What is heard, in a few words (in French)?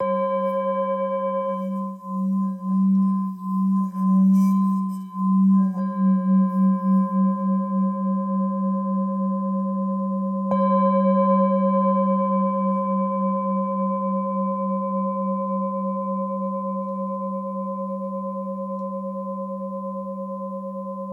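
Therapeute fa diese, bols chantants tibétains; 715g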